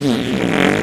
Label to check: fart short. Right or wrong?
Right